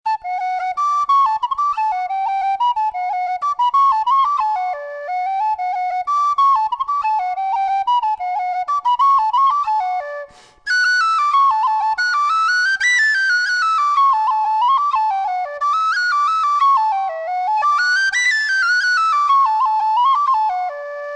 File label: Carbony High in D